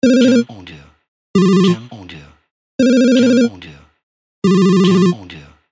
Retro Game

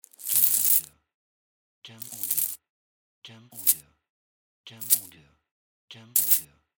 Jingle Money

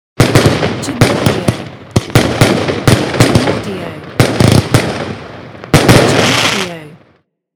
Firework

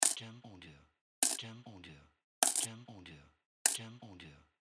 Coins Dropping

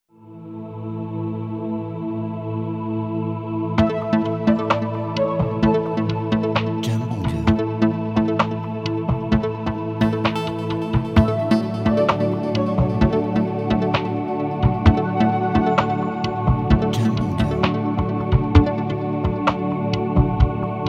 Corporate

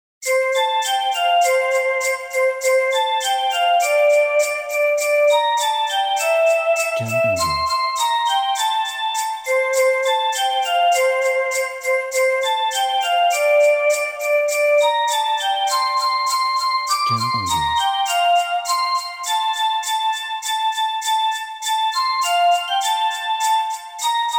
Jingle Bells Solo Melody

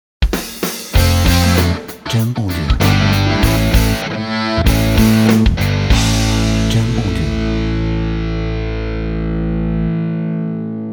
Rock Sport Logo

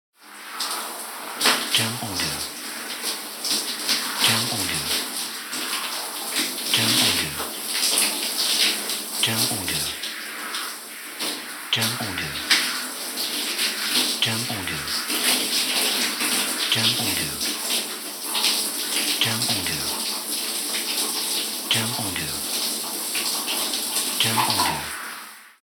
Taking Shower

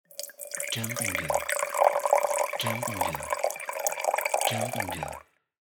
Pouring Wine Short

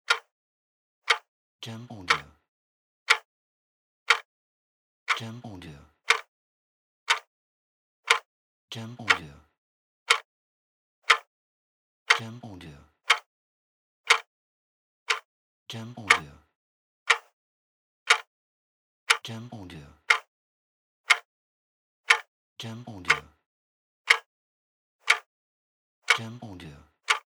Mechanical Watch

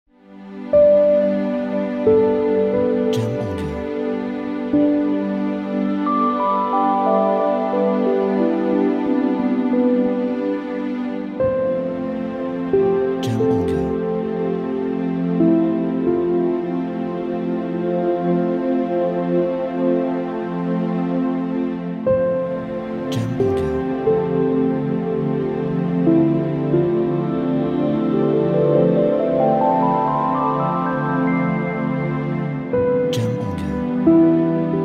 Ambient Background

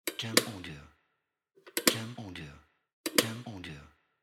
Kettle Lid

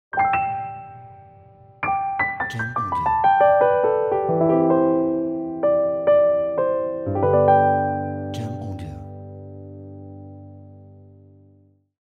Piano Romantic Ident Logo

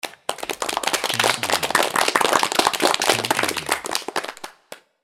Applause

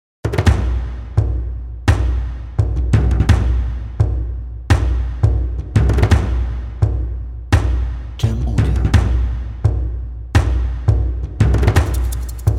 Percussion Drums

